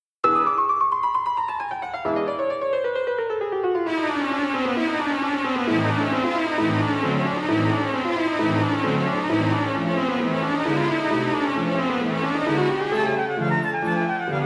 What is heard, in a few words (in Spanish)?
El vuelo del abejorro; Rimski-Korsakov